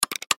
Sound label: Button Switch Gear Multimedia; Web Interactive; Blastwave